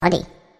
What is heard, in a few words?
click; monstergo; pokebot